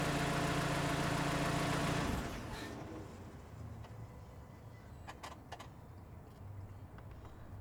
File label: Car Engine Turning Off Near